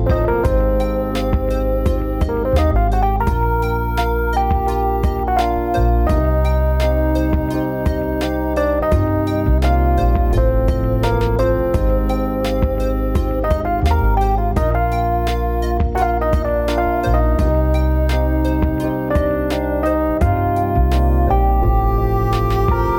Smooth Jazz

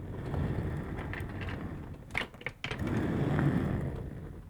Chair Roll; Micro Pack

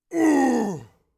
death, orc voice; Orc Voice Pack

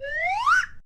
Fast Rise, Slide Whistle

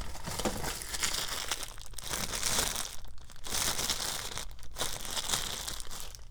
Crinkly Plastic, toolbox rummaging

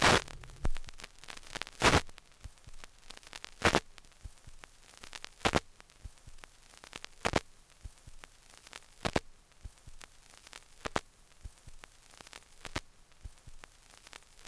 Noise Erosion Bar Loop, record fuzzies; Micro Pack

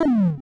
fall, 8 bit sound effects

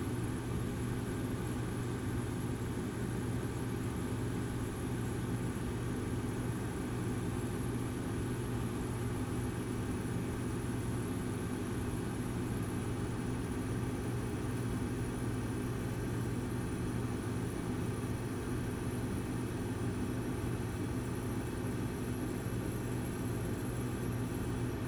Bathroom Fan; Fans and Drones

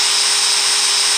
machine; 30 cc0 sfx loops

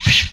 Swipe; MMRetroArcadeSoundsPack

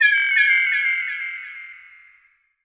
Bird Pan; FREE SFX PACK VOL 1

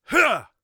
attack, Warrior Voice; Warrior Voice Pack

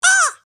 hurt, creature

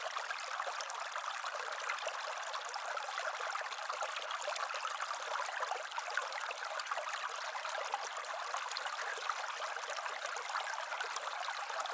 Ambiance Stream Calm Loop Stereo; Nature; Essentials Series NOX SOUND

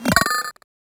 Computer Break; FREE SFX PACK VOL 2

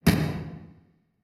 explosion, sci-fi; sci-fi sfx

